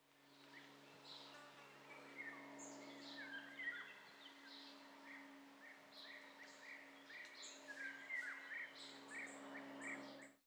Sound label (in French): Très Ancienne Carrière, Avion Oiseaux